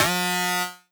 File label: laser gun short; like salamander